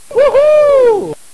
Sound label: woohoo